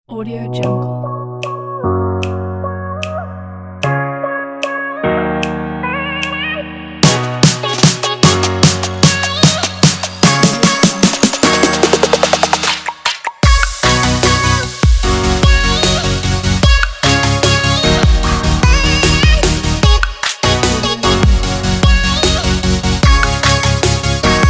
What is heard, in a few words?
future bass